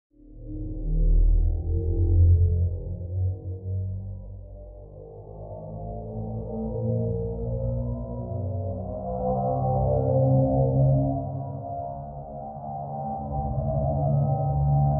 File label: Alien Turbine Whine, drones